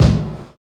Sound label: BD; E-Lab; X Static Goldmine 1